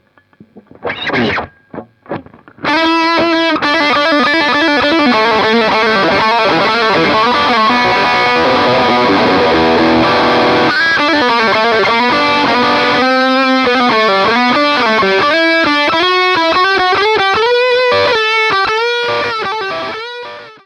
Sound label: lead